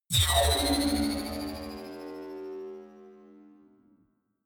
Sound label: energy field vanish; energie